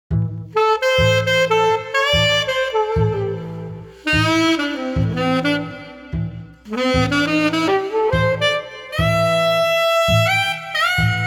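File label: Street Sax Trio Moody no Drums, 120 bpm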